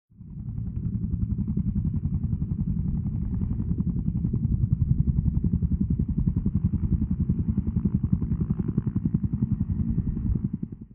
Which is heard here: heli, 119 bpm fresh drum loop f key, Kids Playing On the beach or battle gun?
heli